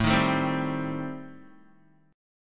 A-Minor 7th Flat 5th, Guitar, chord; Am7b5; strummed; 5,6,7,5,7,x

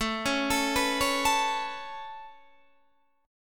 A Major Add 9th A Guitar DADGAD, chord; 19,16,19,16,16,19; Aadd9 arpeggiated

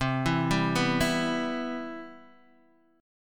C Major 7th, C, chord

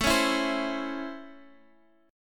Db Minor Major 7th Sharp 5th A

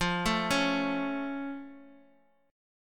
F Augmented F down; arpeggiated

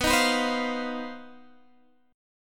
C Minor Major 7th Double Flat 5th B

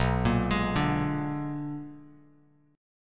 B-Minor Major 13th, Bass Standard; BmM13; arpeggiated; JGuitar

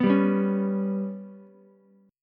G Major Flat 5th Ukulele, chord; GMb5 strummed; JGuitar

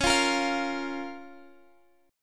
F Suspended 4th Sharp 5th C sharp

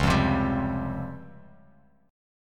Db Major Sharp 11th Db Bass Standard; 9,8,10,12; strummed; chord shape; JGuitar

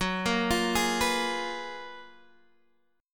Gb Major 11th Guitar Open G

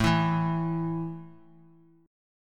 A 5th A down Guitar; strummed

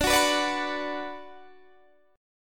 Gb Suspended 4th Sharp 5th D, guitar

